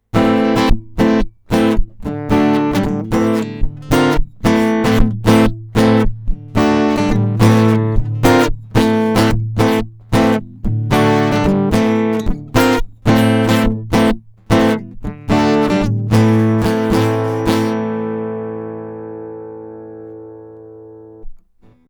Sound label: Calima Git